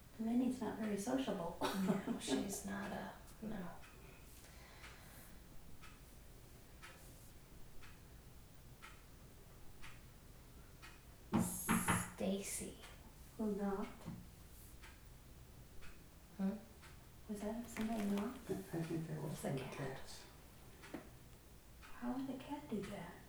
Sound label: knocks; S Finis Virden